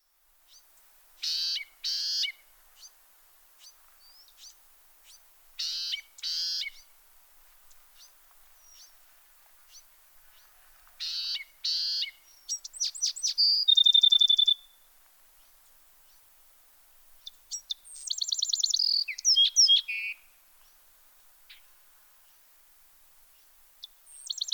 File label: Bewicks Wren